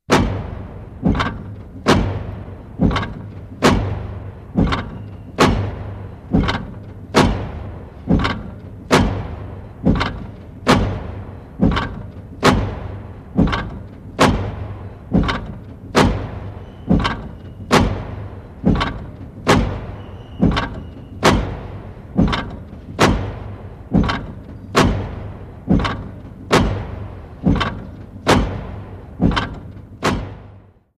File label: Rhythmic Mechanical, factory